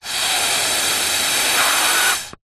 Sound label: human snort nose through straw